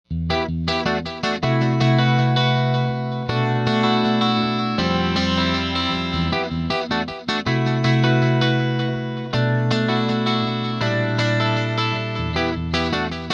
Ibanez JEM7VWh Analog Man Boss DD3 800R Roland JC120s